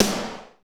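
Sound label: SNR snare, Techno Rock; Northstar; Drumscapes Roland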